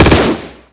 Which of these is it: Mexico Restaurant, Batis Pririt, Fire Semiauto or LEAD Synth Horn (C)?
Fire Semiauto